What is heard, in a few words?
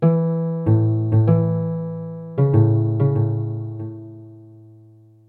guitar